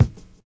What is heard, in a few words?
GROOVE; Univers Sons